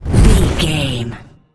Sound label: fireball blast projectile spell